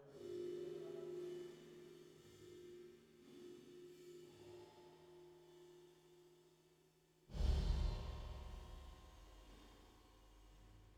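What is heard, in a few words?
480 Reverb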